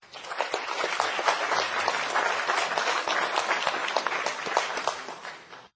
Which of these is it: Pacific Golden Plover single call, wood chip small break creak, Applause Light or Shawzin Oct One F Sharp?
Applause Light